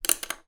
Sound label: office paintbrush small artist wooden down on surface